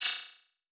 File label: Multimedia Internet CD-Rom Flash Click Multiple